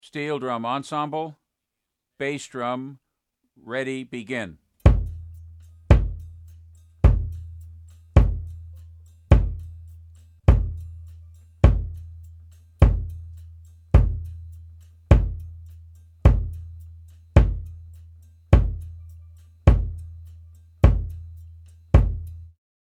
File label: Bass drum